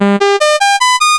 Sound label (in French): gamme